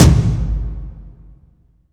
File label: toms, cinematic impact, drum